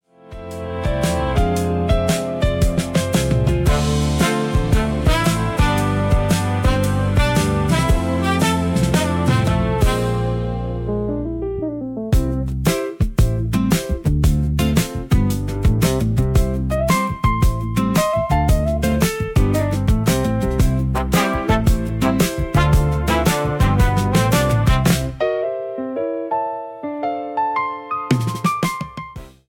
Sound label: Brass